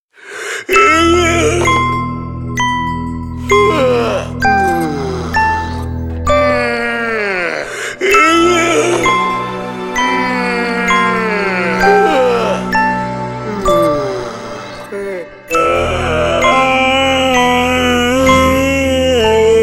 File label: yawn